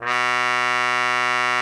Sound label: cup H2, cup mute; Xsample VOL-3